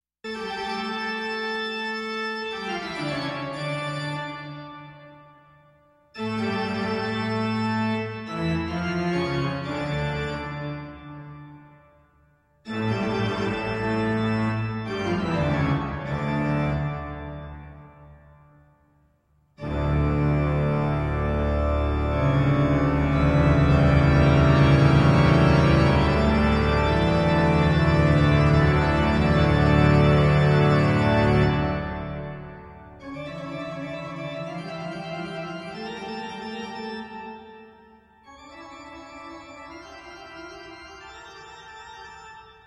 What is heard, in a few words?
Toccata and Fugue in D minor; BWV 565